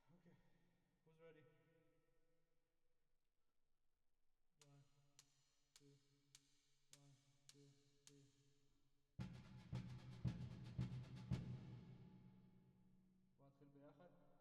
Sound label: Drums Verb